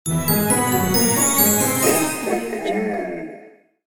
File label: win